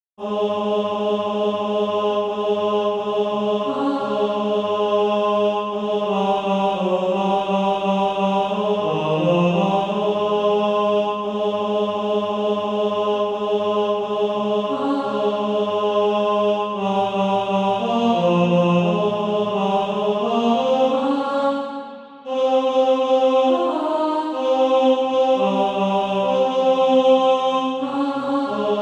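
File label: tenor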